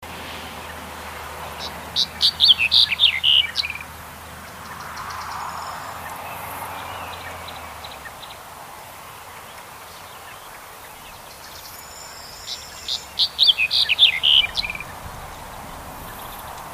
Svarthuvad sparv

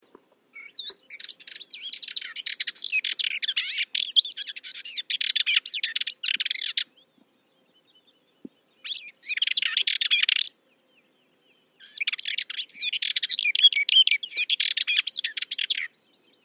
Sammetshatta

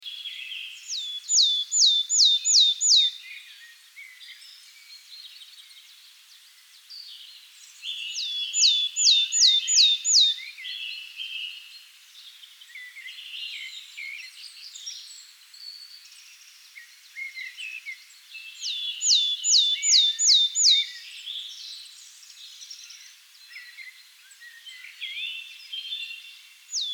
Talltita

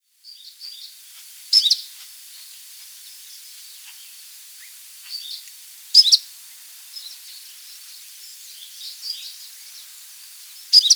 Bergstaigasangare

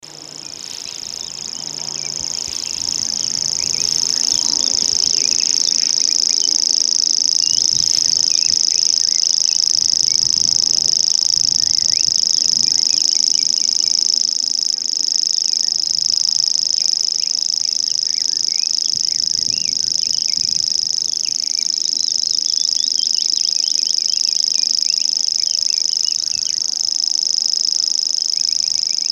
Grashoppsangare

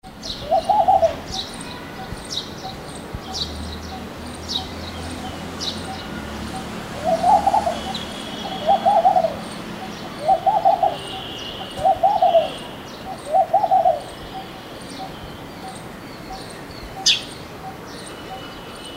palmduva

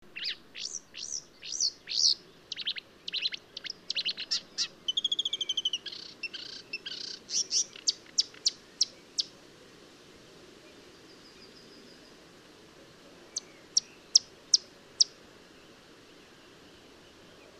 Karrsangare

